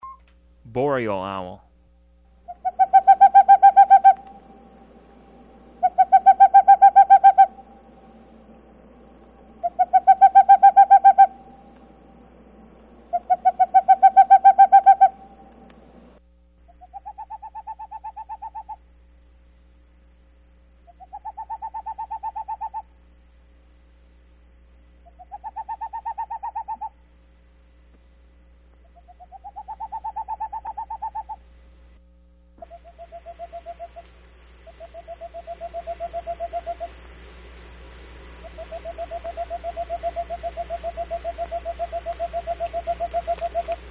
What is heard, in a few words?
Boreal Owl